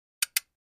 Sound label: Flash Gear Two Low Pit, flash gear